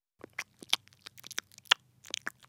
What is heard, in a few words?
Sheep Eat; creatures organic